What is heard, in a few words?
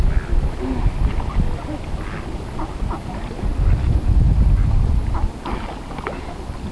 ducks thames